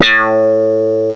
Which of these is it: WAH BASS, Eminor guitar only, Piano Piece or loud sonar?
WAH BASS